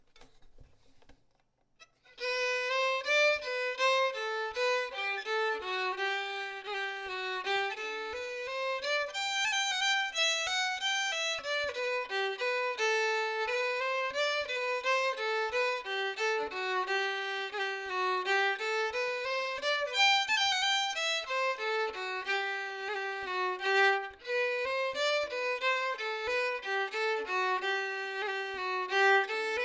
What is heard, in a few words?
Hornpipe, Lent; Wexford